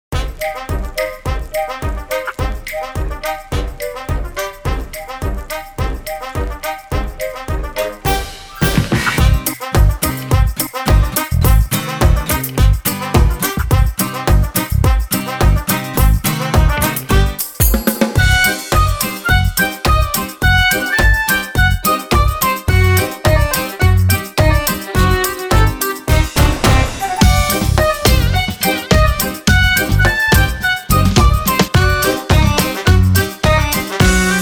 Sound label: Ethnopop